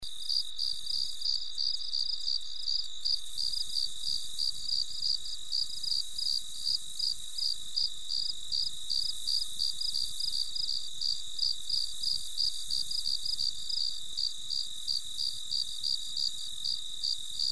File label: melodic crickets at night; nature sound spa